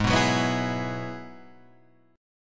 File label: Ab Minor 7th Sharp 5th Ab, down, Guitar, Open G; strummed; 4D,4G,5D,5G,5B,6D; JGuitar